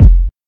bass drum single hit f key; kick drum samples

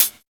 natural hat one shot e key

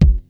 good steel kick drum A key; kick drum samples

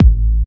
bassy kick single shot g sharp key

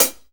sharp closed hi hat sound C# key; one shots; hat samples

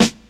perfect steel snare drum sound a sharp key

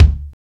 punchy kick single shot d key

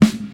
90s studio rap snare sample a key

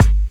studio kick drum single hit g key

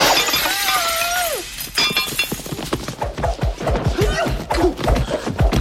groans clanging

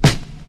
warm sounding snare single hit b key

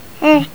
baby talk

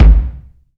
subby kick drum b key; tuned drums

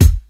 dry bass drum sound A key; kick drum samples

